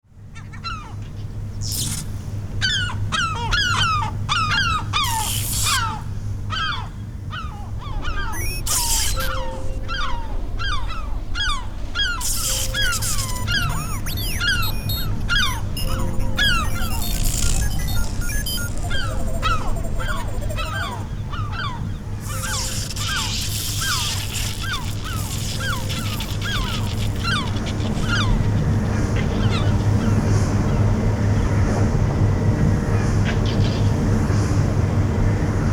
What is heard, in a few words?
soundscapes